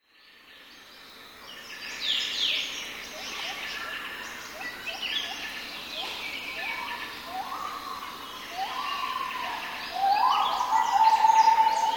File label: Gibbon Forest